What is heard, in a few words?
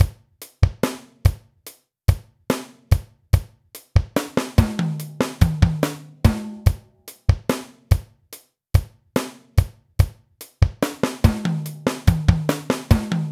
Crowded No Crash